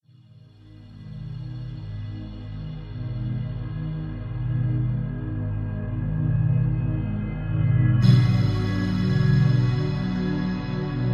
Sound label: Indoor Soft; Will Rock